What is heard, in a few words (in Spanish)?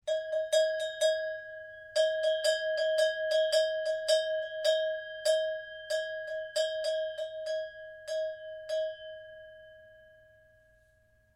cencerrada; felipe vuelve a casa con las ovejas